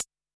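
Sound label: Electro Kit Closed Hat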